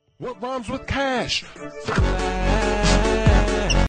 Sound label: pop ringtone